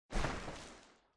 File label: Small Explosion; SoundsCrate